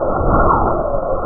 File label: stinger fly; steps; the monster plane; kolmods